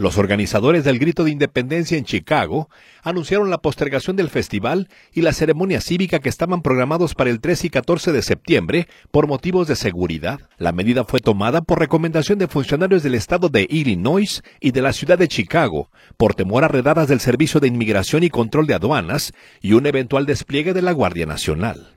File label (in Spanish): grito; El Grito de Independencia en Chicago